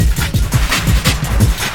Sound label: Film loop small portion